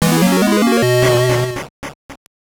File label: Jingle; The Mummy; GBC